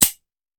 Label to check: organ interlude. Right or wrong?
Wrong